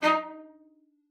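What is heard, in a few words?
cello spiccato D#4, cello; Xsample VOL-5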